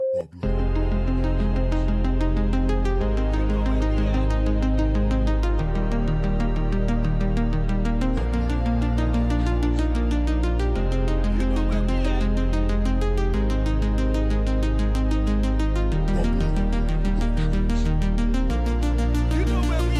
POP EDM

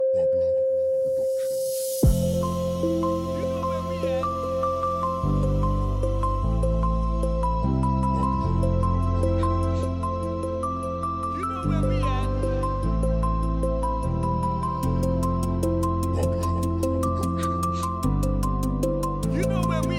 POP EDM

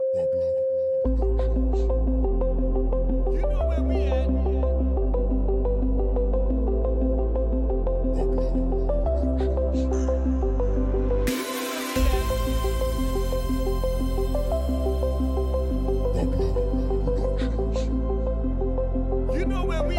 POP EDM

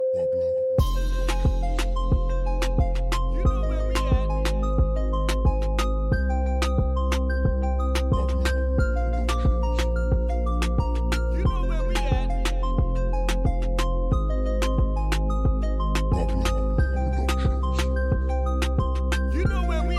Latin Reggaeton

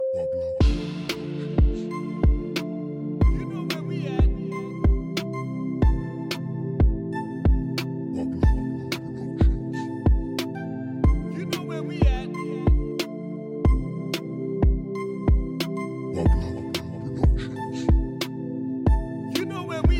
Reggae Dancehall

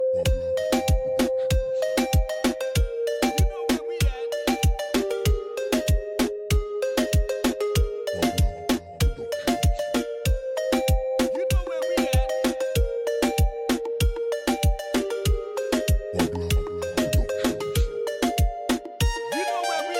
Latin Reggaeton

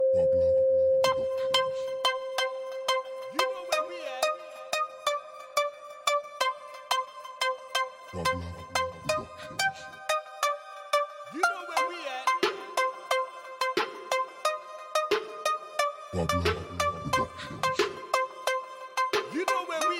Reggae Dancehall

